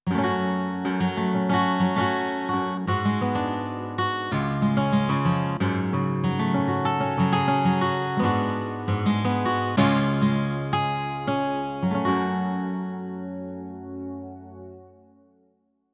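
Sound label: acoustic guitar sample; Clavia Nord Wave; sampled instruments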